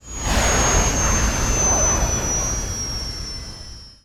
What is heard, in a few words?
ghost out; vehicles; ghost right